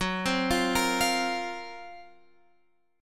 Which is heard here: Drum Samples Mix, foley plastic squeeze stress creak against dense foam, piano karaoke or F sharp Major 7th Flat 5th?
F sharp Major 7th Flat 5th